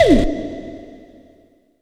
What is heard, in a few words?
Ice; Zero-G Jungle Warfare 1, 2 & 3